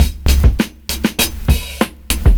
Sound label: 70s Breakbeats; USB Soundscan vol.46; AKAI